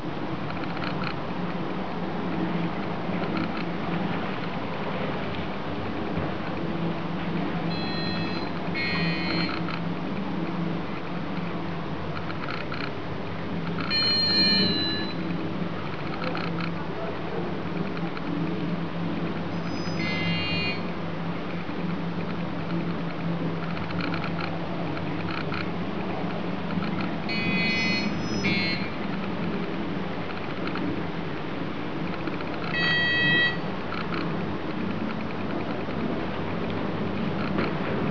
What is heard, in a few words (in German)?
schwarm